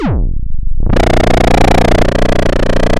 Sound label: Sound of a chainsaw